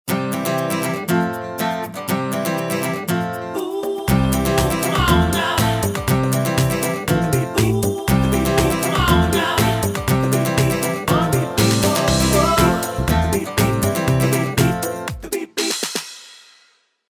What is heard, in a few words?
Guitar, ringtones; BQ 5731L-Magic-S; BQ Russia